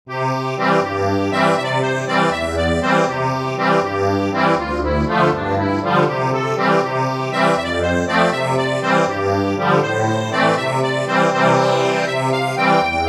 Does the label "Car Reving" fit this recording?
No